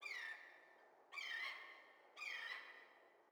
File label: sting wild bird; Rust